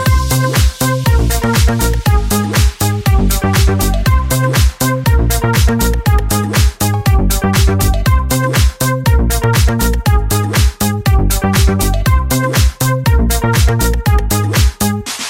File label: Funky iPhone Remix Ringtone